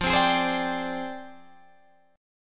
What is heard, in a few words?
F Minor 6th Add 9th Guitar DADGAD; down; chord shape